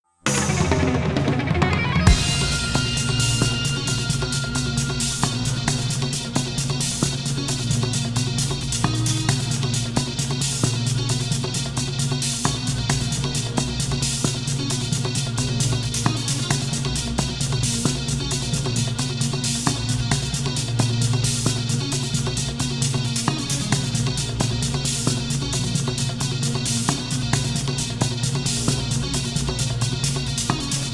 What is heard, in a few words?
music level end; freedom fall